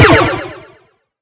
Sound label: Retro Weapon Laser